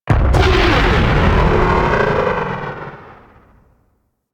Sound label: Explosion; DE; Warframe; Weapons; Archwing; Powers; Archwing Big Boy